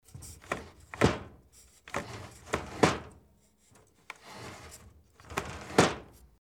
sliding door

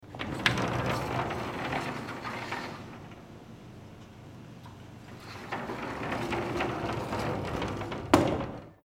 sliding door

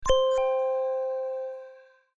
chime

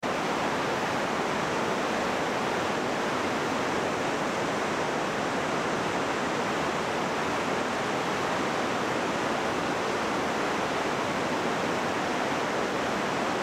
river

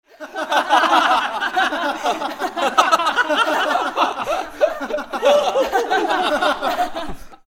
laughter

